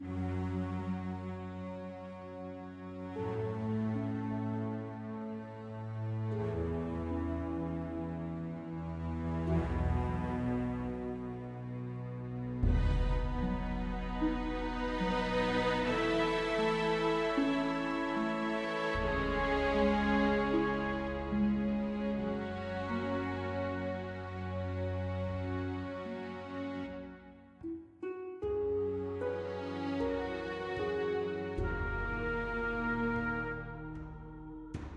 campfire

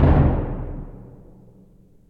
B Drum; Torchlight III; PS4

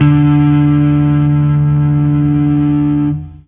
Fuzz D harm; Torchlight III; PS4

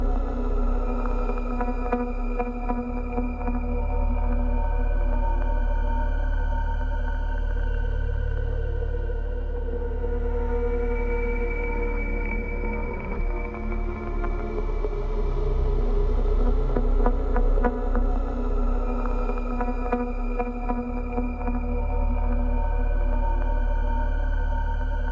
countdown 120bpm loop; Doom 2016

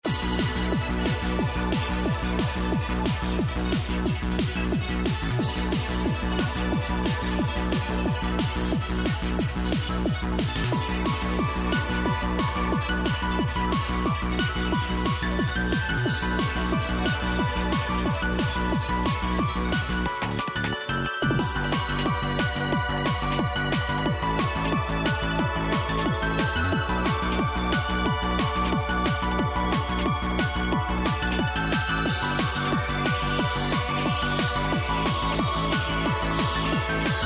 battle normal hispeed; Gisou Shoujo to Kyokou no Machi; Android

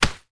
Dash Slam; Boomerang Fu

